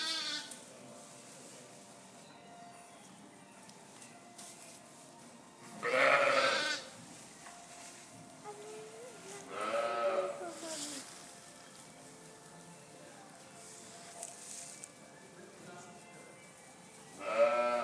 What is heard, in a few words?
lambing shed noise